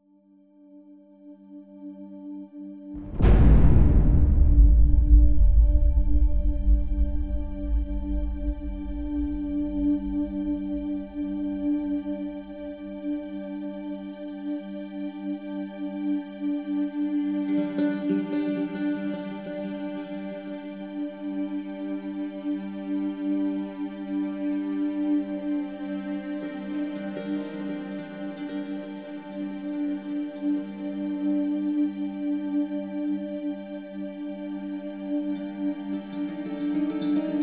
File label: Middle East - War - Damaged Lands; Civilization V